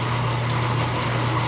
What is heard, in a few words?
door; Shadow Man